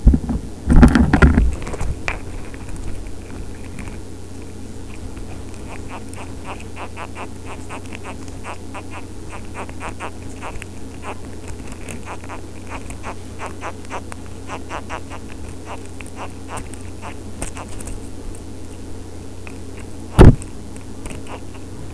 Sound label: chirp, hermit crabs